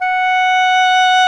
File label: SOLO WINDS